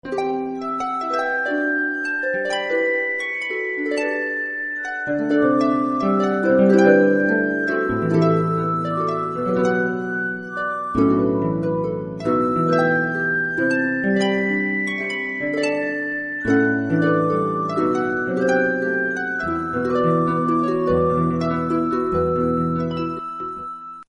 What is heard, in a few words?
Irish Celtic Harp Greensleeves